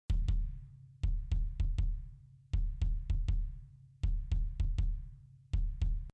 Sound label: kick